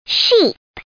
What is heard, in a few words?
SHEEP